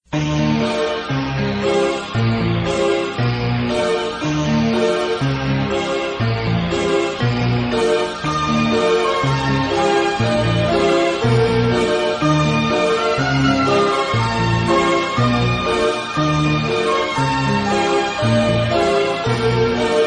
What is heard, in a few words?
Instrumental